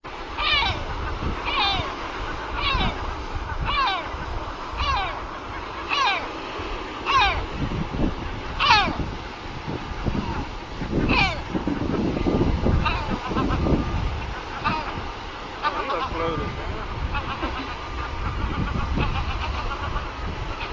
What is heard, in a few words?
great black backed gull